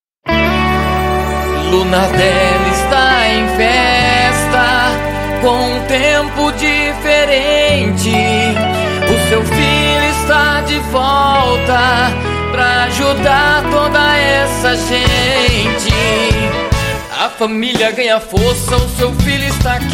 jingles gospel

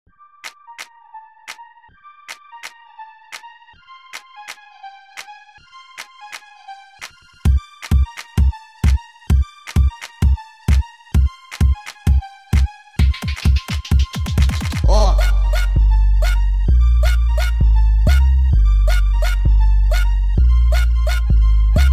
funk

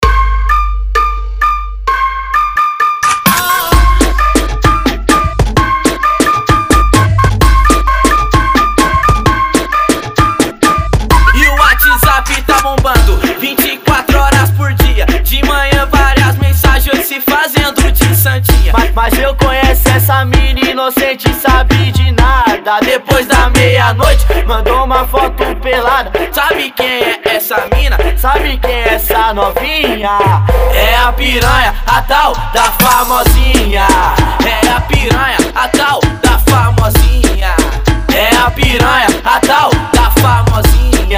funk

